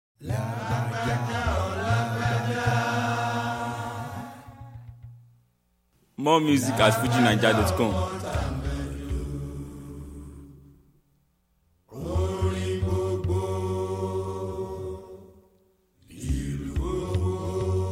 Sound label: Drum Affimation